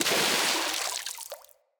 Impact Debris Medium Water; Weapon Impacts

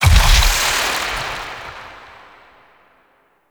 Shadow Particle Death; Warframe; Duviri

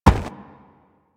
Crewman Footstep Run; Warframe; Corpus

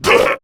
Pain, Grineer Beastmaster, Barks, Battle

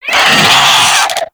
Infested Biped Pain; Warframe

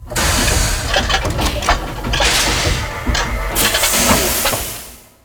Dojo Equipment Chests Open; Ambience; Warframe; Mod Quest; Teshin's Hideout

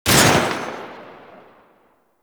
Hand Shotgun Shot; Hand Shotgun Prime; Weapons

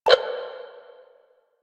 Enemy Static; Warframe; Lasrian

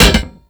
Cast Iron Hit; Gameplay; Awakening Remaster

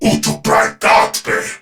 Surprised; Warframe; Battle; CorpusCrewmen; CrewmanC

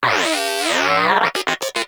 Inquisitive Vocal Greeting; Pets; Warframe; Moa

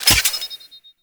Rifle Reload; Warframe; Weapons; Tenno; Sundial

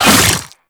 Fast Whoosh, Weapons; Infested; Coda Pathocyst

